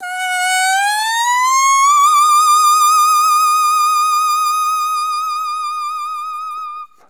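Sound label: english horn; Best Service; Peter Siedlaczek's Advanced Orchestra; EH FX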